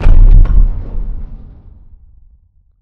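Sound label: explosion distant metal; corporate; Titanfall 2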